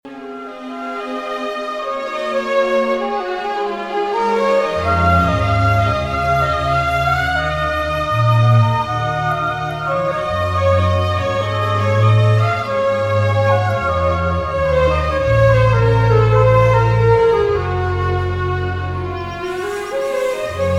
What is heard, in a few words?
In Strings, Tamil BGM Ringtones; Kaaviya Thalaivan